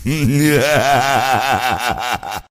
risadola malefica

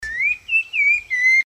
sabia laranjeira notification

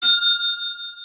som de campainha

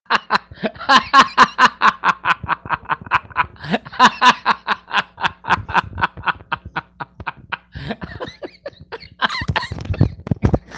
risadinha

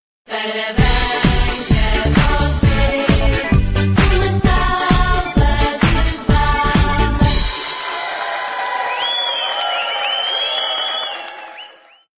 aniversario parabens

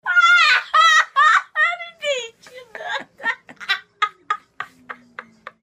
risada do gordinho eu nao entendi; zoeira

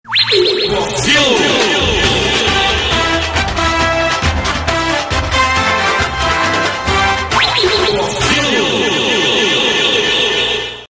vinheta

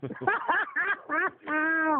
risada

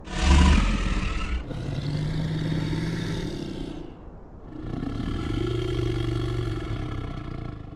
som monstro; rpg

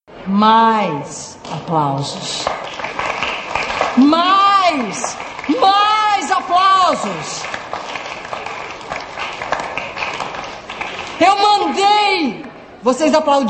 aplausos; flora mais

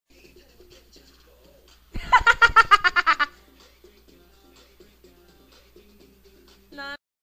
risada

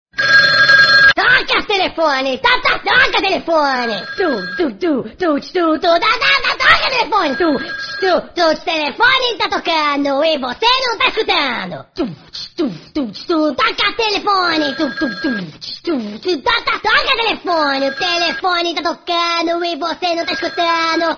toca toca telefone

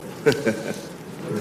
risadinha; joaquim barbosa

